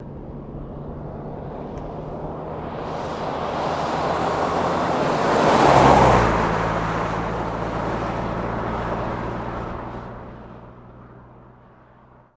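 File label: Jeep Cherokee Medium Pass By; Jeep Cherokee 1984 Medium Pass By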